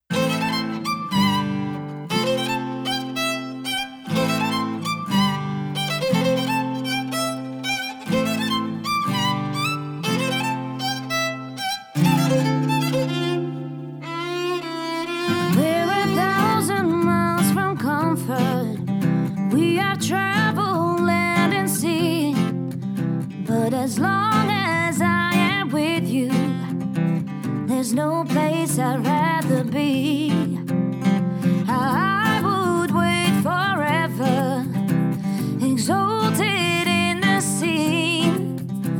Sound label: Folk Version